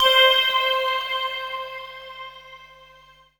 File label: synth bell